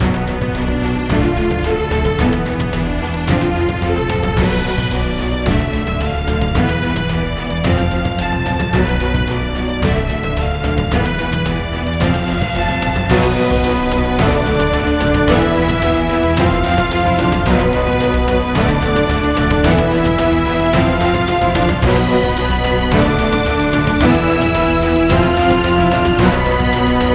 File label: cinematik; by burak sound bank; tension gerilim vol 49